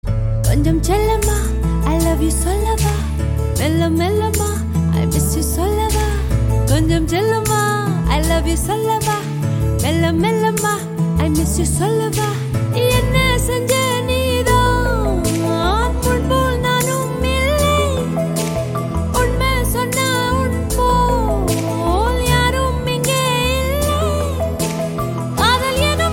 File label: Female